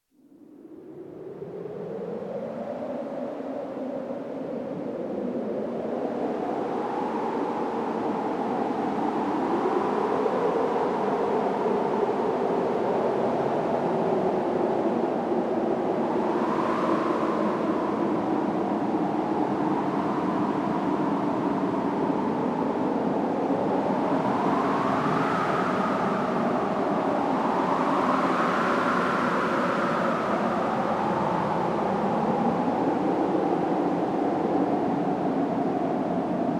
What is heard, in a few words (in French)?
vent polyph; Sledge : vent (filtrage « polyphonique »); Démo audio des fiches didactiques de sons analogiques détaillées dans le livre